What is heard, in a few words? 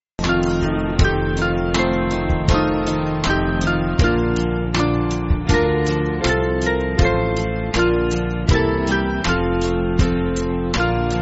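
Band, Music Backing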